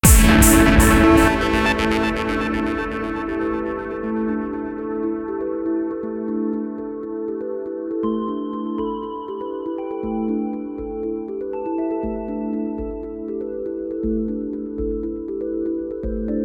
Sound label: Winning; Sanctum 2